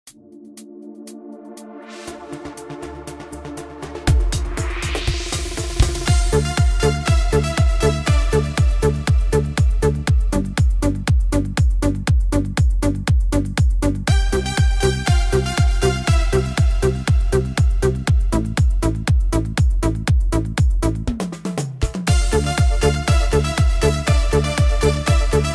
dance instrumental korg pa4x; Martina Style

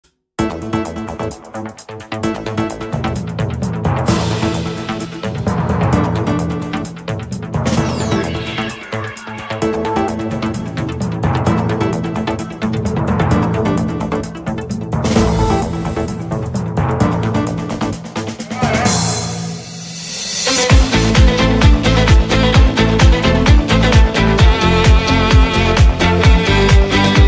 orchestra turbo classic

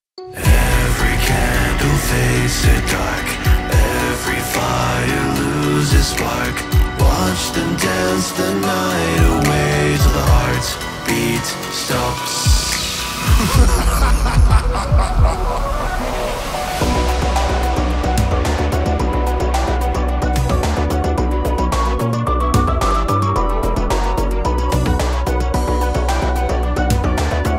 music box remix; FNAF

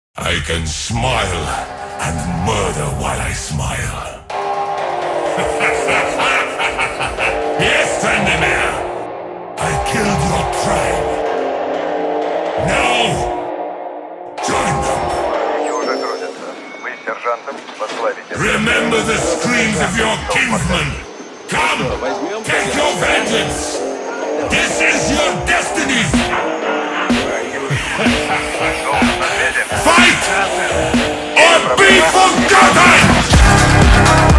phonk

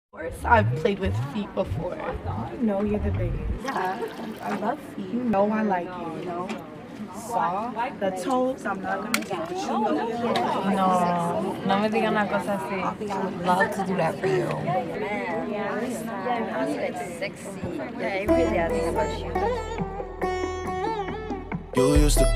mashup bass boosted

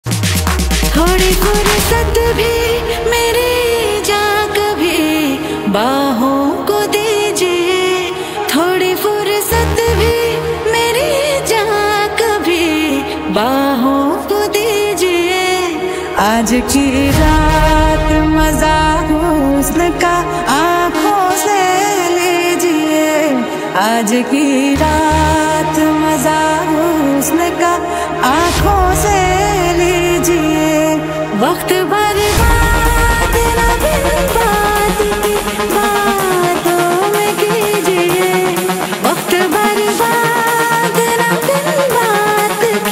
techno remix; stree 2; bollytech

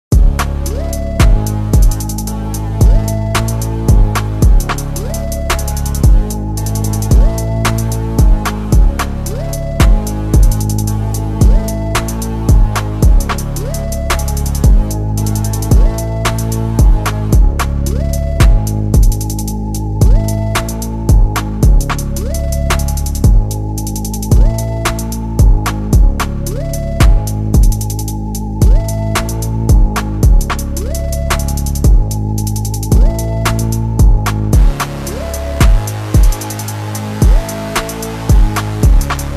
instrumental slowed reverb

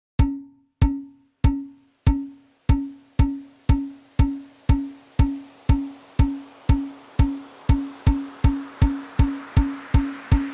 karnay bass

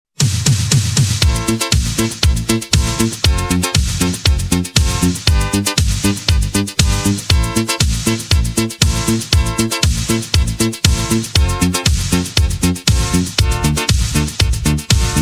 fantasy sci fi eurodisco 80s; Chloe Moretz Ai

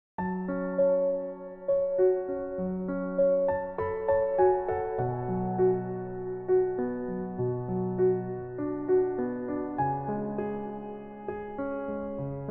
piano version

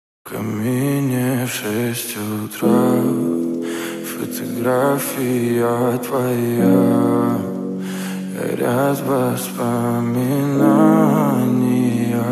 slowed reverb deep version

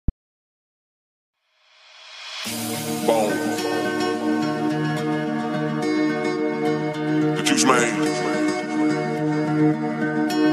deep house remix

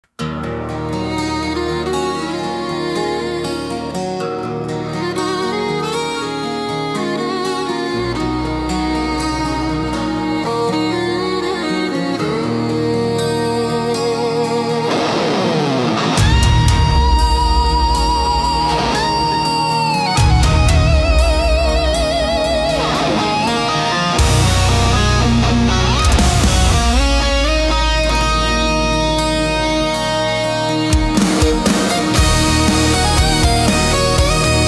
epic country metal track

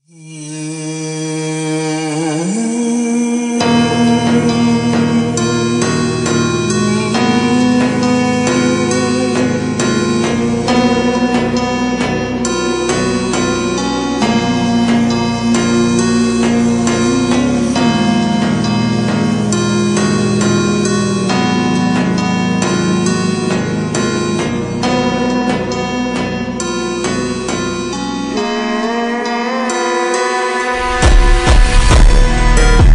ultra slowed reverb brazilian phonk

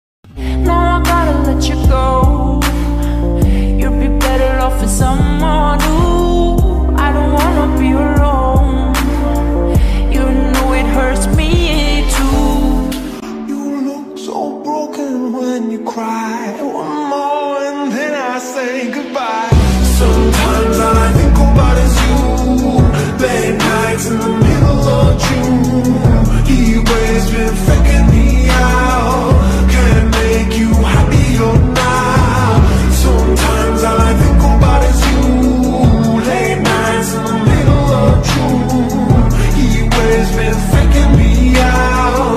cover slowed

